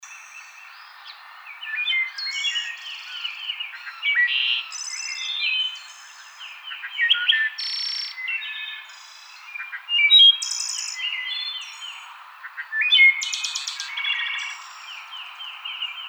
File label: Wood Thrush